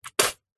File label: human spit; zapsplat